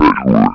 jump; opentdm; bunbun

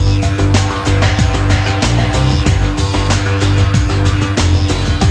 music

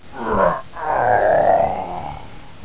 death; ratamahatta

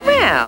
DROWN; Wilma

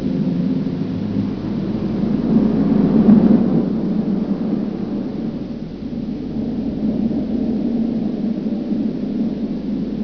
blow

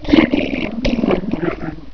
DROWN

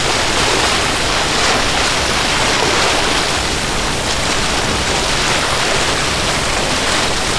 falls; zaero; world

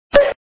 spike

